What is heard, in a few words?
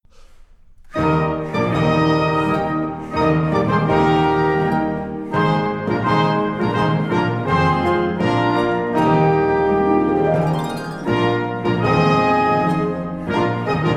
TIMPANI, orchestre; Salut